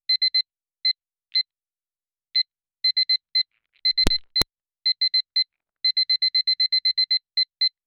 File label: a buzzer used when someon, game show